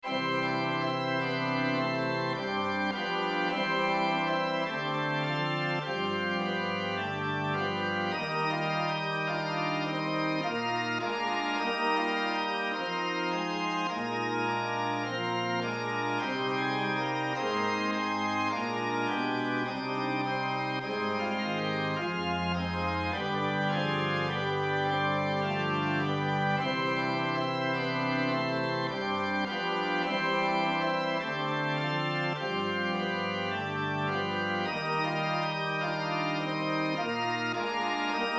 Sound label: organ; methodist